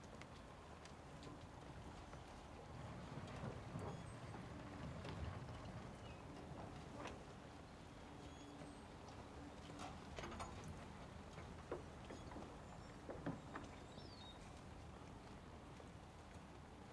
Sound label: ambience General; jackhammer 2